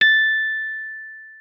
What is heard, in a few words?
RHODES MK1 A6; Session Instruments; Best Service ProSamples vol.42